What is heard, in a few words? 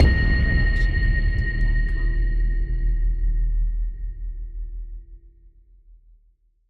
cinematic hit pin drop; soundscrate